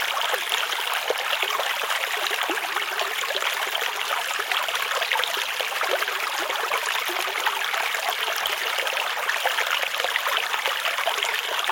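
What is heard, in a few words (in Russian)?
приятный звук журчания весеннего ручейка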